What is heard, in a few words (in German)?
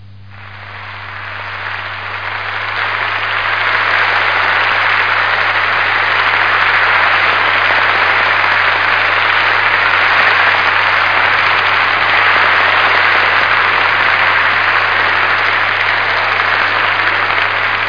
Applaus